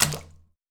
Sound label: Puddle